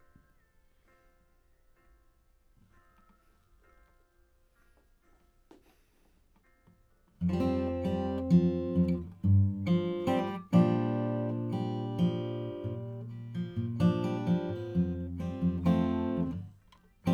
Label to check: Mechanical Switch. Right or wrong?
Wrong